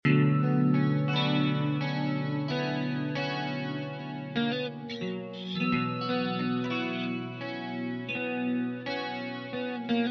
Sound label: mellow two guitars melancholy melody